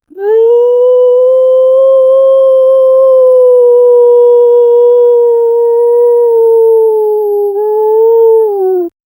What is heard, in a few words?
forest creature saying oo, creature vocal